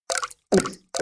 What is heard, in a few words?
bottle